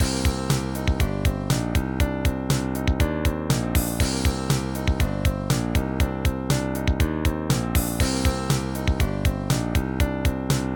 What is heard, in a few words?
Spacey; Wacky Wheels